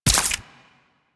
Arch Gun Primary Beam Impact Flesh; Warframe; Archwing; Hildryn

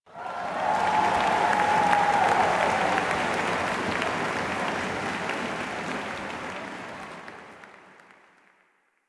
Amphitheatre Crowd Applause; Ambience; Duviri; Warframe

